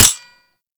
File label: Anti Aircraft Gun Mech; Weapons; Warframe; Archwing